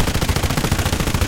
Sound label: loop shilka